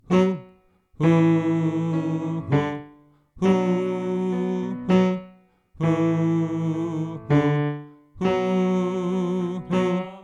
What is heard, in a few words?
Tenor